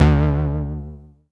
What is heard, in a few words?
Reece Wobble Bass; Big Fish Audio; Old Skool House; OSH Bass